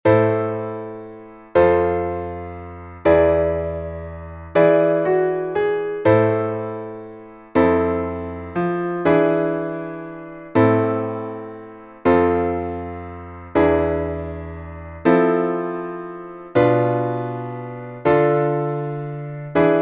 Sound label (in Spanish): entonacion Piano; Entoación con acompañamento; Só acompañamento